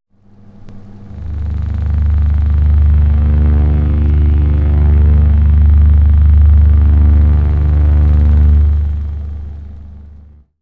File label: boiler Drone